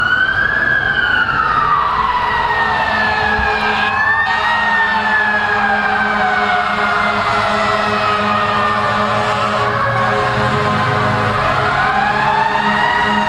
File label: E-One Hurricane Q2B